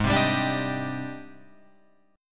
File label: Ab Major Sharp 11th Ab, Guitar Open F; AbM#11; 8,7,7,9,10,10; strummed; chordshape; JGuitar